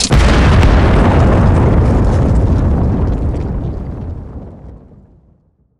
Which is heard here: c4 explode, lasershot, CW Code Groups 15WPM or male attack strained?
c4 explode